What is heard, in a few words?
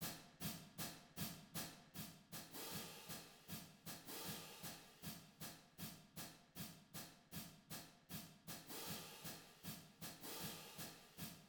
cymbals